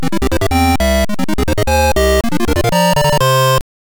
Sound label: Course Clear; SMBS; Sharp X1; Super Mario Bros. Special